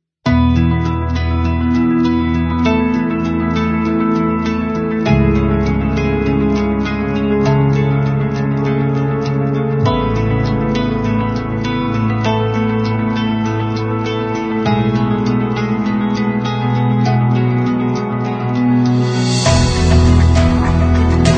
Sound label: Cinematic Emotional Uplifting Inspiring